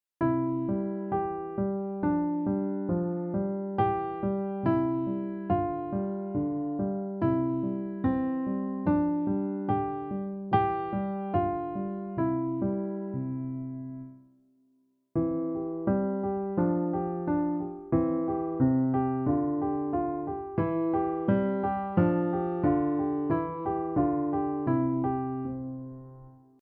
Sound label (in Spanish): Acompañamientos con negras